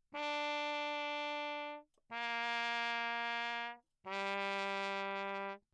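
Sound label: trumpet mute low; Bach; Perinétventiltrompete; CrownMess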